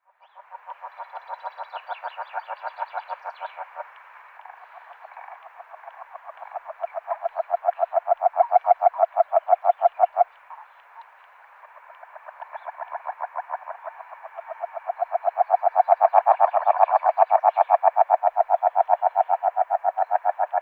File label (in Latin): Rana Dalmatina